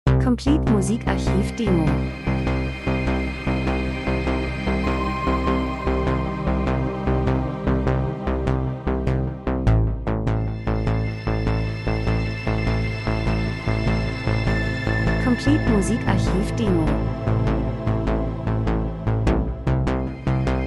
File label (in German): drums, rhythmus; Sequenz Rhythmus geheimnisvoll Experimentell Wissenschaft Pulsschlag Produktion Ablauf Verfahrenstechnik Maschine Industrie